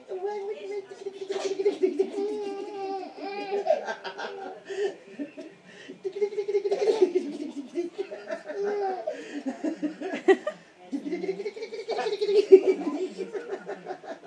giggle

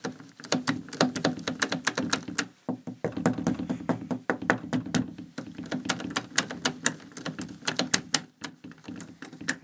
ten seconds of being trapped in a wardrobe

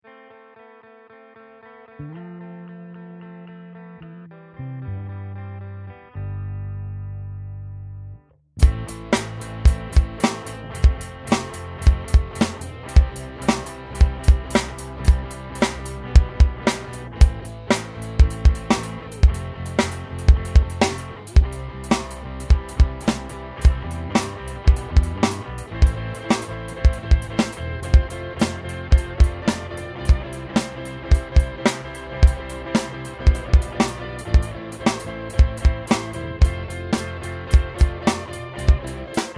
4 4 rock with fill

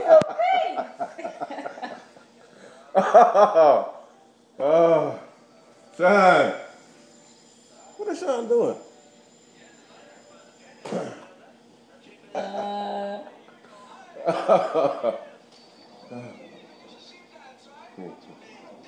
laughter in my house

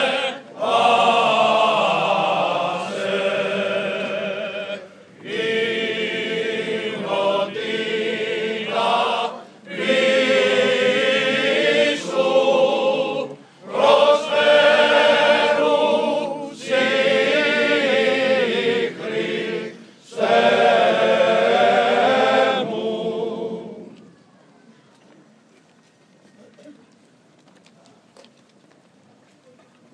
choir easter in corfu

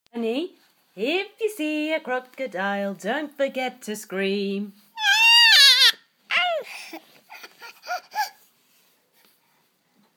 don't forget to scream

